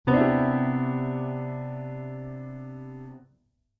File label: Cmaj9